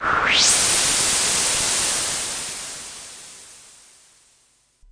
Super Bass Station, Noise Sweeps

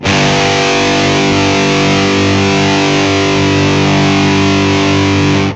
big distorted G, guitar

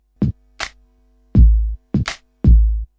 080 bpm drop my kick n clap

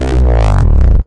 desecrated bass hit, evil bass sounds

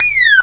jump down; VIDEO GAMES; monsterb

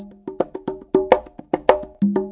090 bongos, 090 bpm; Bazillions of Bongos

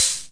wersi perc, Wersimat 24 drum machine; drum machines

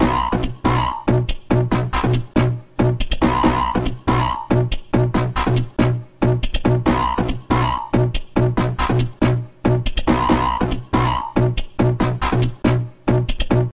140 outback bouncy bat; SYNTH AND ELECTRONIC LOOPS

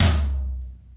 real life TOM, Another Real Life Kit; AMBI; REAL LIVE KITS

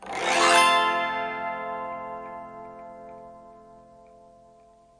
autoharp F, Autoharp Chords; Multisampled Autoharp Chords; WORLD

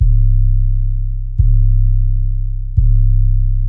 130 bpm 808 bang; drum loops and breaks

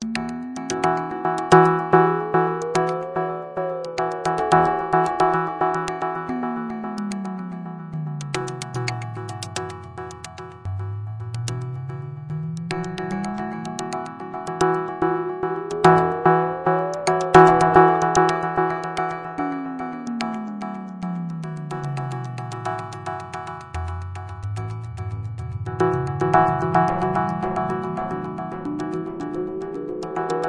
110 echoey sine clicker; SYNTH AND ELECTRONIC LOOPS

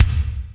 EMU SP 12, drum machines; Kult

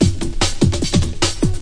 148 solid breakbeat; 141 to 160 bpm

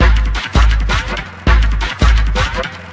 163 flanging tribe, drum loops and breaks; 161 to 180 bpm

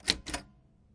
keypress, Typewriter; UNUSUAL KITS and FX; Bauhinian Vibes Typewriter Kit

